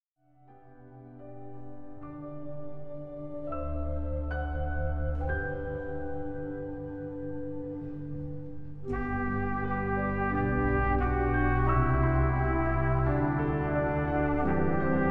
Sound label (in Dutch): fantasie over 2 oud hollandse kerstliederen